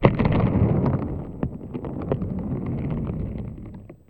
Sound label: Plastic Tub, contact mic, Marbles